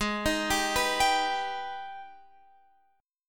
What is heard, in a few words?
G sharp Minor Major 7th; x,x,x,13,15,14,13,16